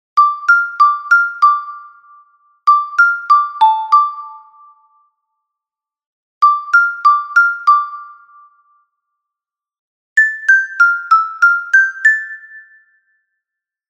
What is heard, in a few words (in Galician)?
Xilofono Soprano; Samain